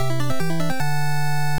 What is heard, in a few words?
Level Up; Tenchi o Kurau II Shokatsu Koumei Den; Family Computer